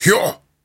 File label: Grunts and Chants, Viking Vocal